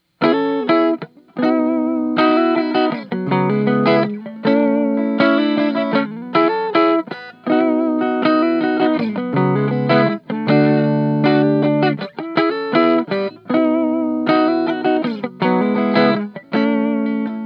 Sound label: 58 neck clean, gibson les paul; Gibson Les Paul 1958 Gloss Standard Reissue Tea Burst